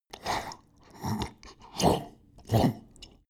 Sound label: dog drink snort; natur-tiere; hunde